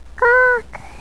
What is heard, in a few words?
quack